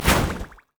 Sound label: inferno bomb; LoL; ziggs poolparty